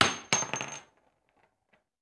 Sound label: Bullet Shell; Sound Ideas; Guns